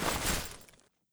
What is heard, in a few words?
m4a1 deploy